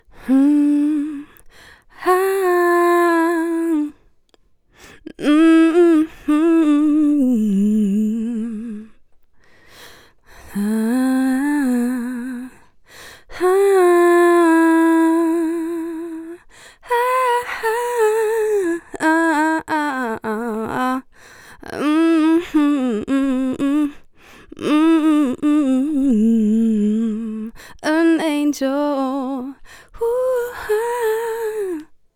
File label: rode nt1000 adlibs